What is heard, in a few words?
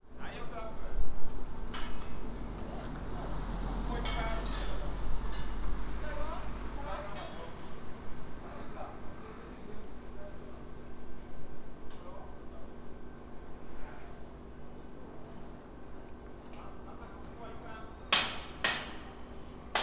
bunka street